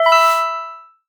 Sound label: bonus ready